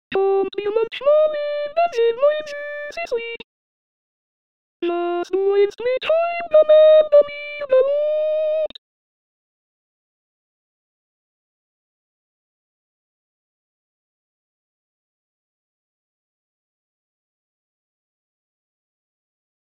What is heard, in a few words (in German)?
Zigeunerlieder soprano